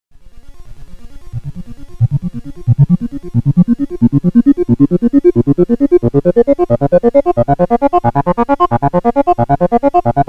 ARP Avatar Patch VCF via ext VCO WHEEL ext VCO via LFO